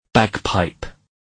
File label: bagpipe